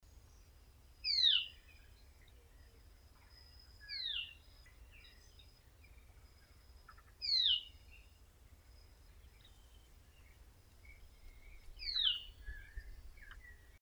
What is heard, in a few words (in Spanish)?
bailarin naranja, Bailarín Naranja (Pipra fasciicauda)